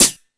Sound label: High Hat, Drum Machines E-MU Pro-Cussion